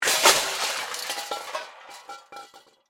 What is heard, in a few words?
Crash Metal Pots, Crash, Metal, Pots, Pans; BlastWave